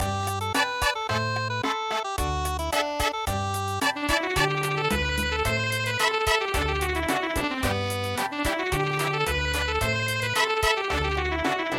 Luar do Setrao Cha Cha Cha

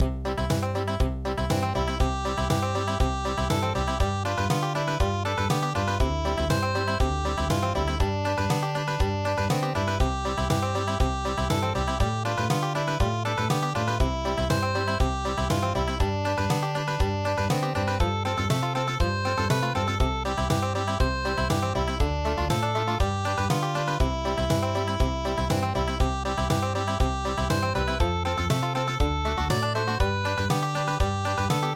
Country

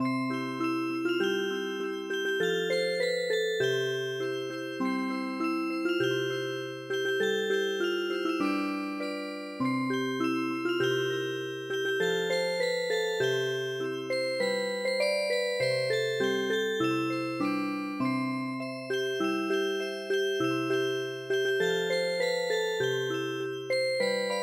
Music Box, traditional

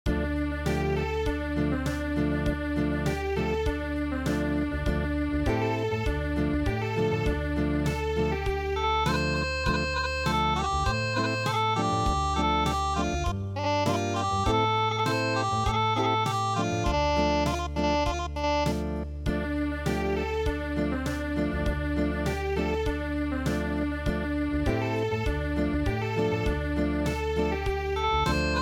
chonko bushi Unplugged

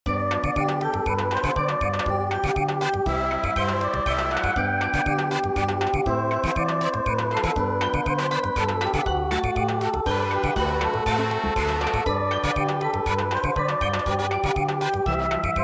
Samba

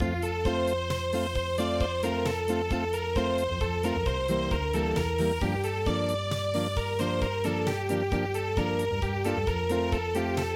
Unplugged

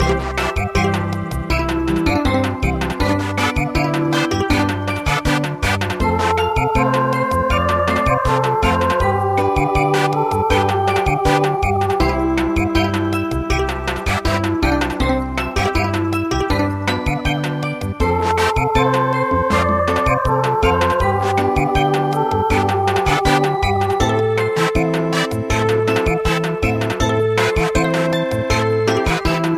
Samba; Sasho

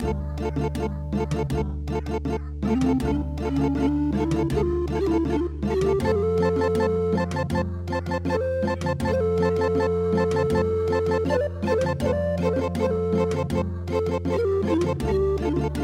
andes

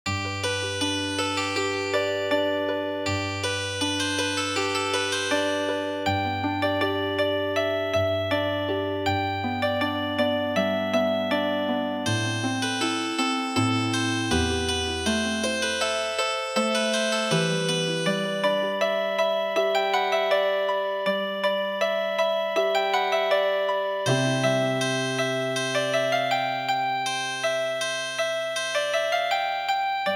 Healing Harp, traditional

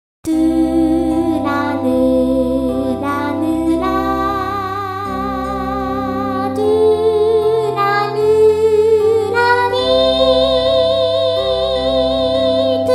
Irish Lullaby, vocal

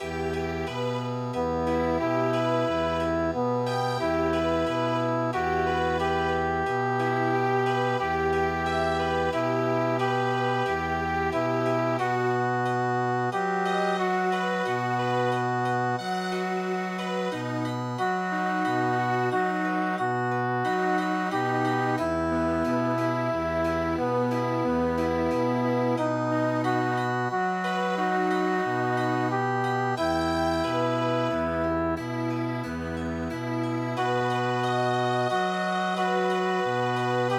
Chamber Music

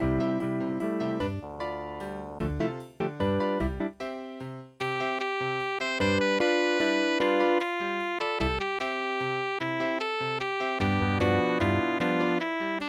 santa lucia jazz waltz

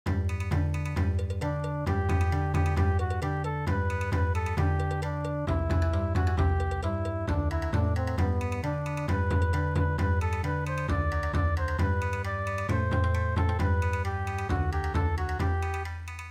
Bon Odori

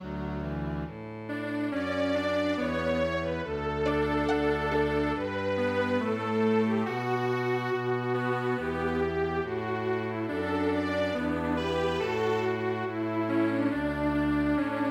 Caro mio ben Strings Quartet

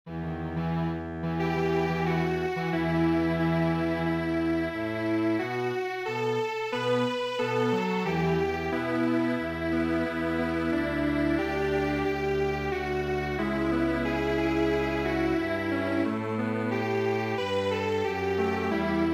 Strings Quartet, traditional; East Virginia

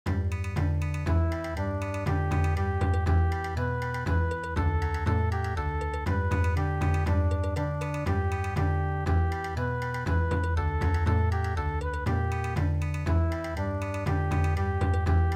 German Dance Song Bon Odori

